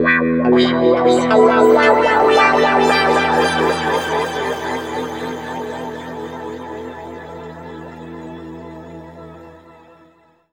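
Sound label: E pitch shift wah, Guitar Samples; East West; Steve Stevens Guitar Samples Collection; PTCH-SHFT+WA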